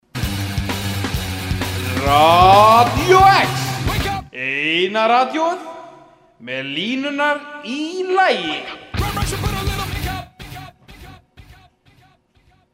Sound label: jingle; Radió-X